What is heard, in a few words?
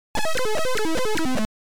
Title Beeper; Cobra